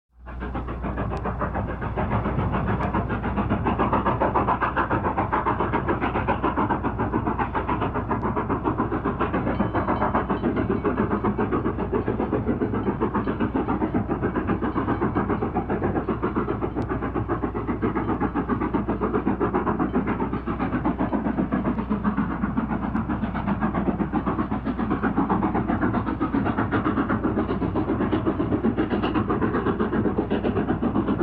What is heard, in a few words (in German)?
dampflok rio grande western; Geraeusche; Bahnhof; dampflok 282 nr480 dinner